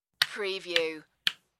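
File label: Board Game Piece 3x; Board game sound effects.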